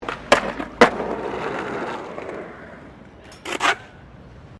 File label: SKATEBOARD SKATE BOWL START STOP; Skateboard sounds; Skateboarding (Sound Effects:Sports); SoundEffects-Foundation4